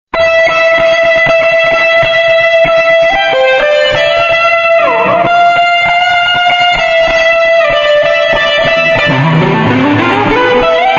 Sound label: jingle bells guitar